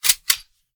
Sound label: bolt; tacz; daffas arsenal